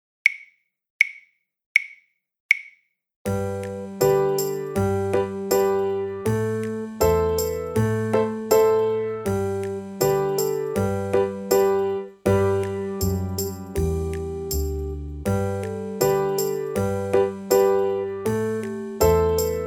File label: Eighth Note Workout Backing Track